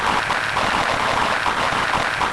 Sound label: Barrier Sparks; Barrack 1.0.0; Barrack Sounds